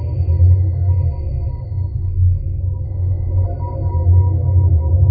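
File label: atmosphere; Zero G; Man Machine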